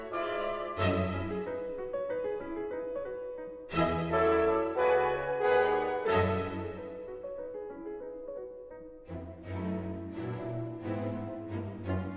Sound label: Concertino for Piano Orchestra; Allegro Larghetto Allegretto